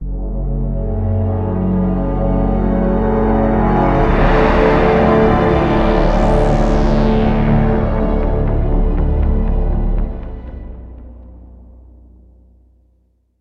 Sting Evil; Mass Effect

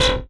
retro ui menu error, Retro Classic Menu UI Beeps

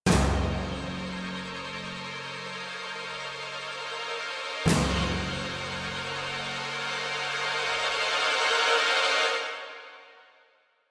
Stinger Suspense Short Intense, game soundtrack; Pillars of Eternity

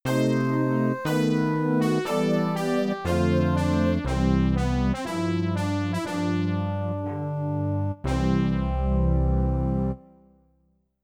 The End, Gravis Ultrasound

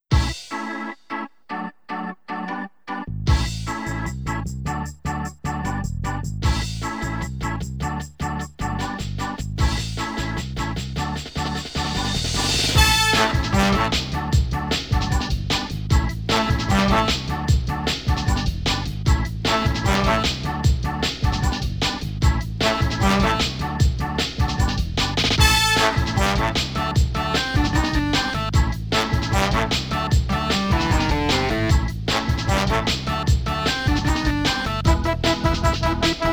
Championship Won (No Crowd)

